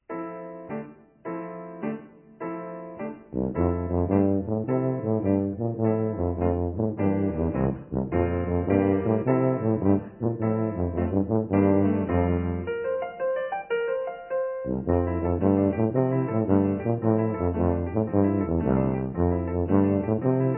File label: Brass Wassail Song